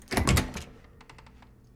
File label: Door Opening